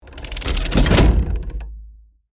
Heavy mechanical movement

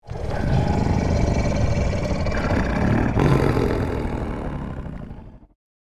Large beast monster growling; fantasy

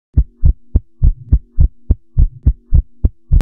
Emergency medical kit stethoscope heartbeat fast

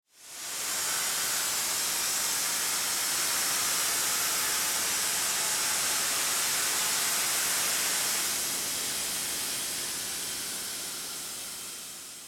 Event smoke machine fogger running; machinery

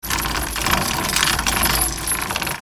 Metallic chain pulling rattling; metallic sound effects

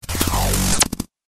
Modulated digital glitch

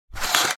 Shower curtain slide rings on metal rail; blinds

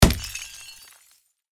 Deep glass impact

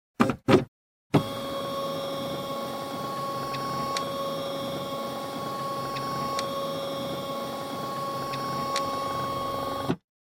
Epson ET printer scanning a document

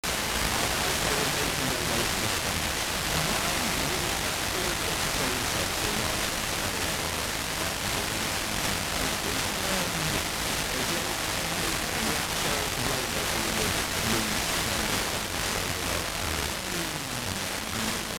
Radio static noise loopable

